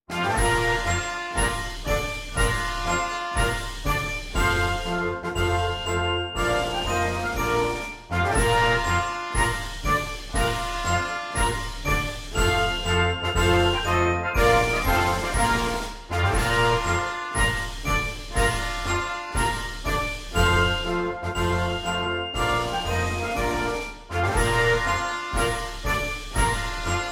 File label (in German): Marsch